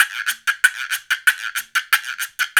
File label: Gua Guanco; Spectrasonics - Supreme Beats - World Dance